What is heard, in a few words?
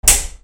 BATHROOM